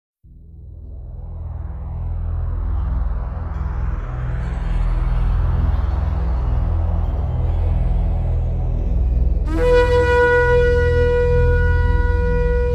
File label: Instrumental; Hindi